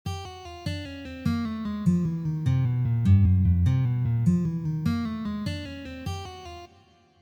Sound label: Pull Off